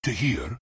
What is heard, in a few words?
bearform move; lone druid